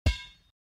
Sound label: basketball dribble go go